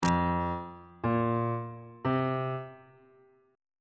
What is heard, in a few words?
e sharp suspended 4th triad chord intervals on bass clef